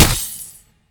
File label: pwr hit; serqet; smite